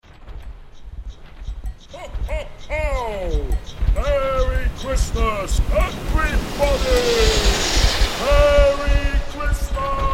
Santa On Sleigh, Santa Claus passing on his sleigh with reindeer from left to right; Santa Claus passing on his sleigh with reindeer from left to right with a whoosh into the night sky wishing a ‘Merry Christmas’.; Holiday